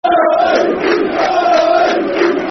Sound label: Toon Black And White Army Football Chant; Newcastle United Nufc; fanchants-com